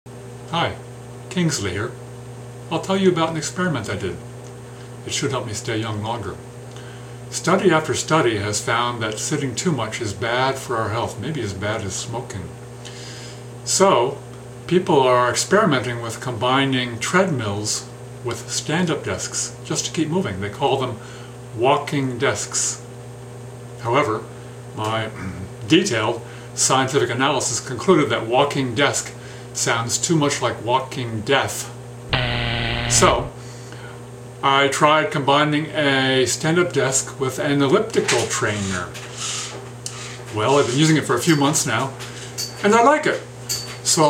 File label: elliptical desk